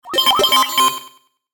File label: Video Game Warble Swell Short; Warped